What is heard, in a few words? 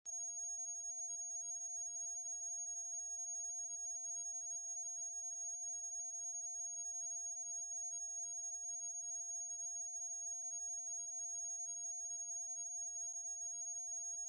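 komar moshk, mosquito gnat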